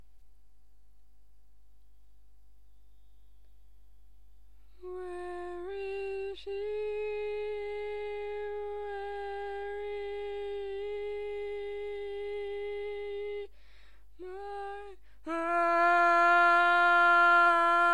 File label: Tenor, barbershop